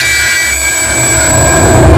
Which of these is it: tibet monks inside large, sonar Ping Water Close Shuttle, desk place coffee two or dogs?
sonar Ping Water Close Shuttle